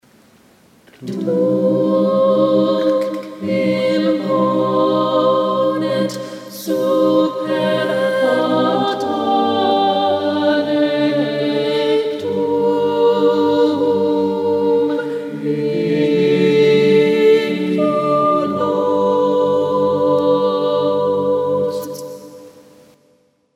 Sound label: SATB; Allegri last page chorus SATB; Lent/Holy Week 2013 Rehearsal recordings